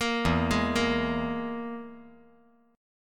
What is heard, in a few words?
E Major Flat 5th